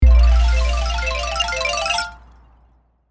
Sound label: Slot machine casino multiplier win boost